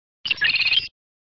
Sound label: Sound SFX, flipper; sttng